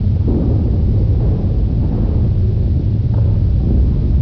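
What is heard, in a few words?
TURBULENCE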